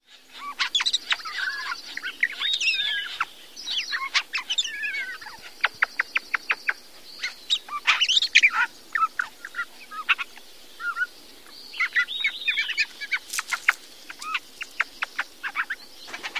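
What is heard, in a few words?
song like sequence, bird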